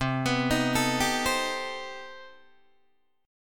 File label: C Minor Major 13th C down Guitar DADGAD